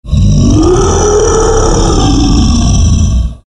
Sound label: danger growl; kreaturen